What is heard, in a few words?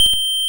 Guitar G4; multi-samples; CHIPSHOP DELUXE